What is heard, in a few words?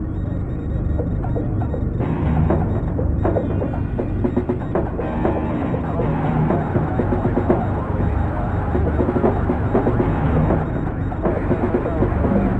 ambient loop; Heatseeker; ps2 psp wii